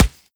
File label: sand, bullet impacts; Half Life Model Pack